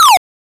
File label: shoot, attack sound; カタナ１